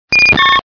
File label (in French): Cri